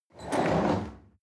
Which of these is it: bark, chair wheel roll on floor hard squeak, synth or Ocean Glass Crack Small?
chair wheel roll on floor hard squeak